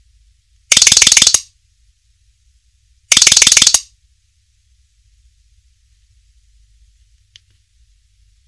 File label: Nokia Ringtone 1.3